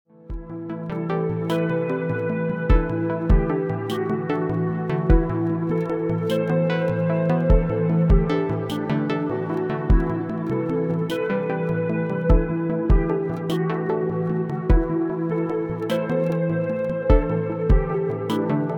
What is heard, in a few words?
Chill lo-fi beat